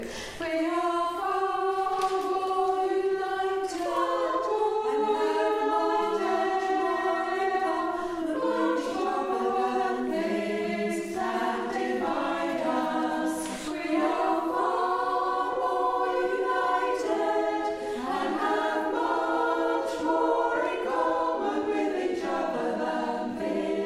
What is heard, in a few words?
Sop Alto; Far more in Commen